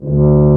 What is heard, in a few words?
Tuba mf D1; Digital Sound Factory Virtuoso